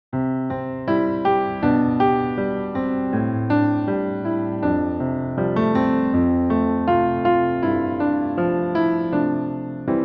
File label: Piano; Whate'er My God Ordains Is Right; Indelible Grace Hymnbook